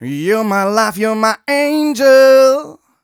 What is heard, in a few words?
m 127 mel a, Vocals